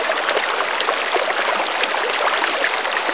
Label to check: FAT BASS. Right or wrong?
Wrong